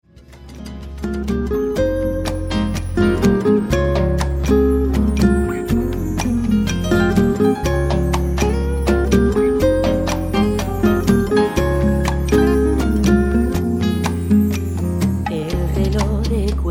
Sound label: latin fire